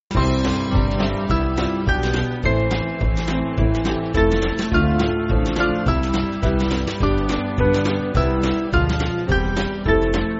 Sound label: Band, Backing Files for Churches; We Sing Of God; Magdalen